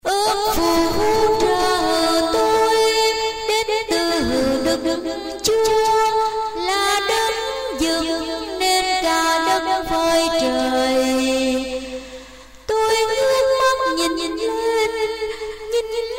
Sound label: basso tenor